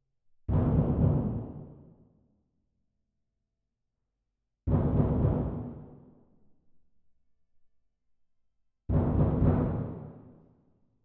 Twin R Perc

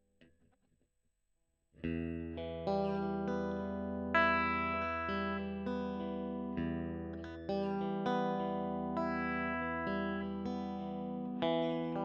E. GTR Gefell

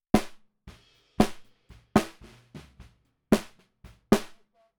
SNR Bot

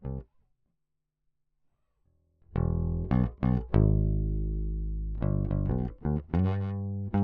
Bass

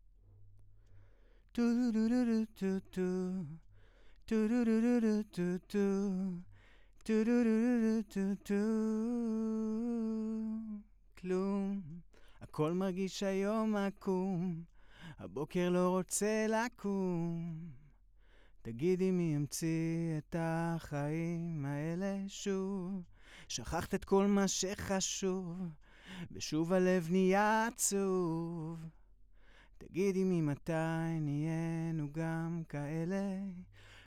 VOX

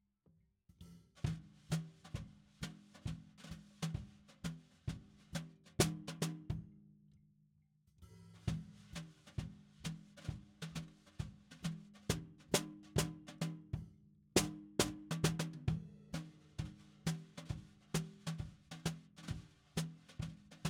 SNR BTM

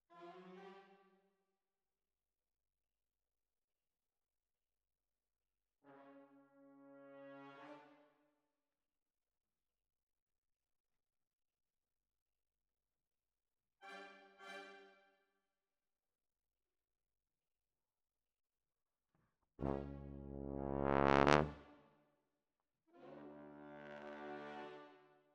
Tuba, jazz for piano

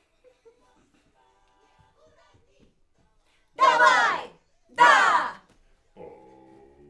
Backs AKG

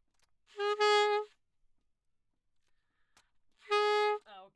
Sax; Gefell

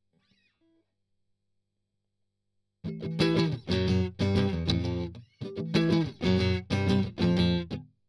Strum Octav

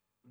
Spring

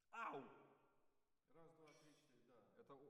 ORTF SCHOEPS, piano

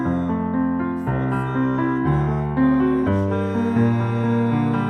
Piano R

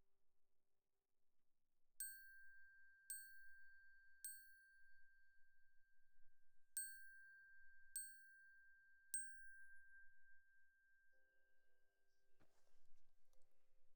Glock Close

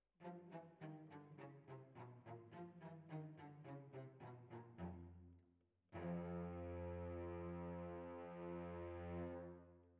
Violas, jazz for piano

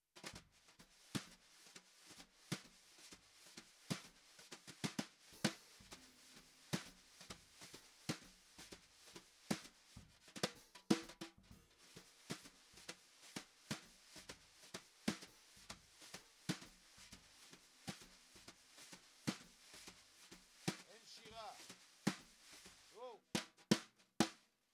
SNR

